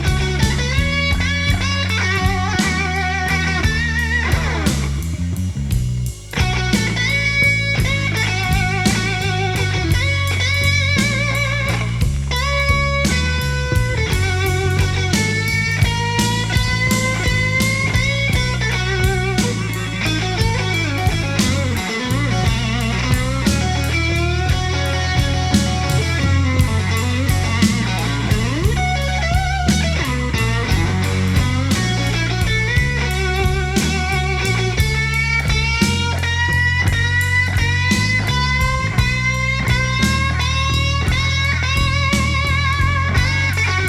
solo

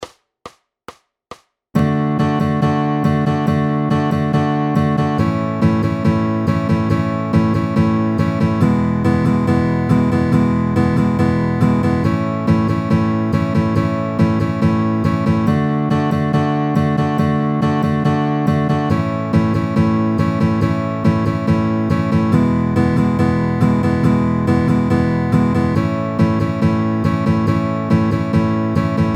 D D A A E E A A at 40 bpm

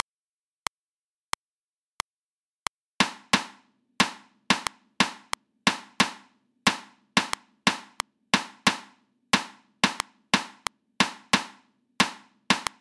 reversed rhythms 16ths at 90 bpm

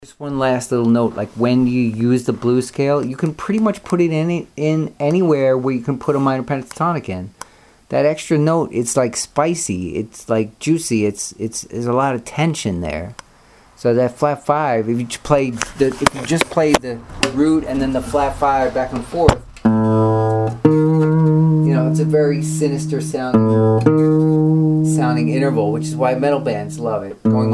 blues scale

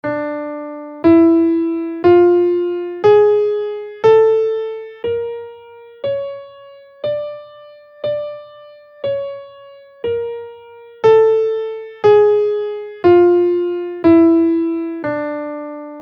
double harmonic minor scale in Dm

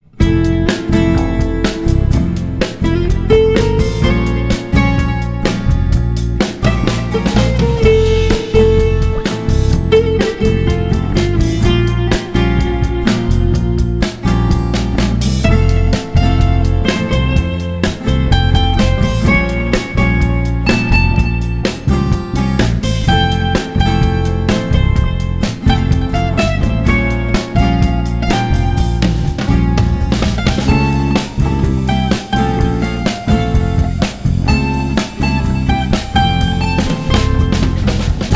harmonic major

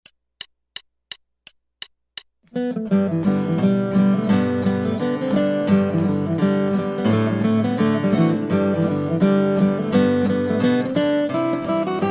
Guitar

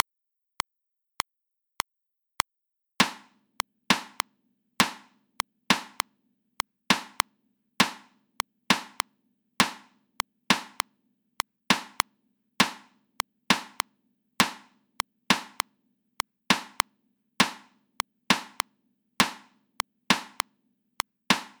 reversed rhythms at 100 bpm

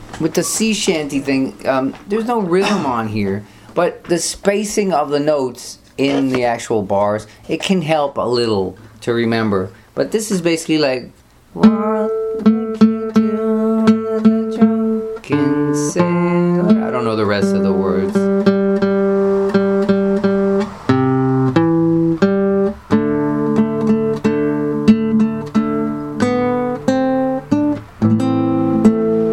sea shanty intro

